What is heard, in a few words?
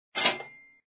money; money maze